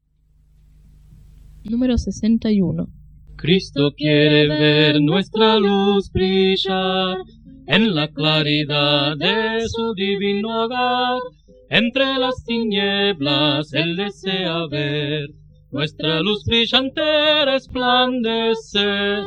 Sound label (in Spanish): Coro, himnario niños